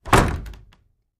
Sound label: Attic Closet Door Clo